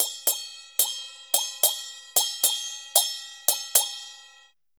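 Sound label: CHINA SM10 L, China cymbal; Spectrasonics - Heart of Asia CD2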